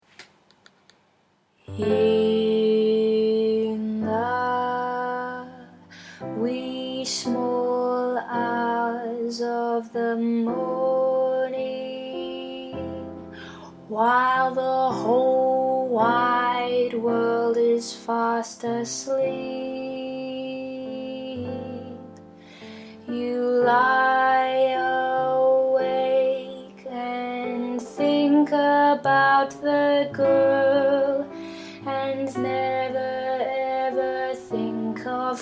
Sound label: Alto; Alto 1